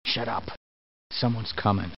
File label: shh